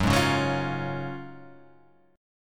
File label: F sharp Major 7th Suspended 2nd, F sharp, down, Guitar, Half Step Down